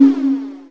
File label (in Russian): knopka suhaya yarkii blizkii zvonkii